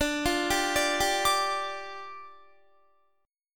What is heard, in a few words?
D Minor Double Flat 5th, down, Guitar; Dmbb5; arpeggiated